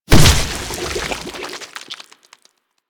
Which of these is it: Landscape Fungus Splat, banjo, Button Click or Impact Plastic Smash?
Landscape Fungus Splat